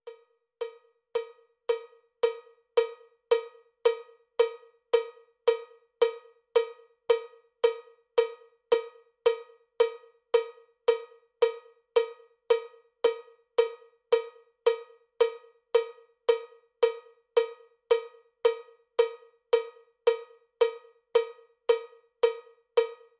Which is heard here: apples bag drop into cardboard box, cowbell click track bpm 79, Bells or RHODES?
cowbell click track bpm 79